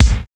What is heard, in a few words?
kicks